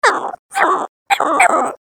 creature coo funny

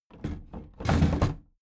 big plastic case lid put down regular

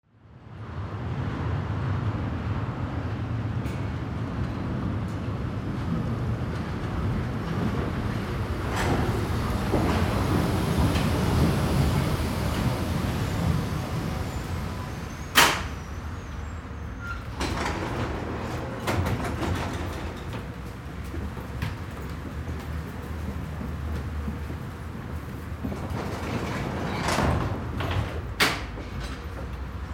cable car arrive and leave

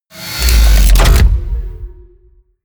promo pad

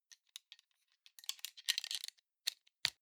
plastic frame small mechanics rattle

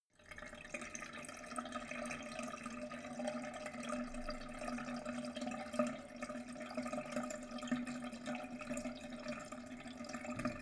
sink drain medium

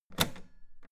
old button heavy hard push

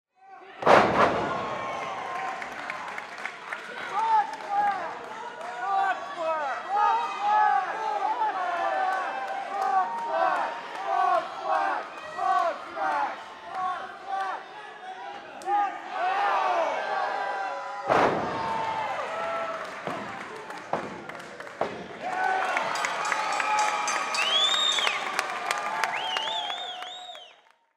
wrestling end of match

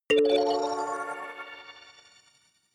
notification kalimba magical fifth

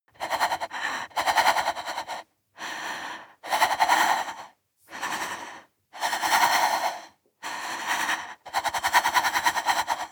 breath female stutter sequence

